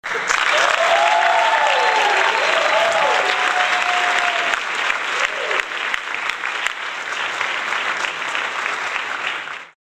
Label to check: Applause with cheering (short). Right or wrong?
Right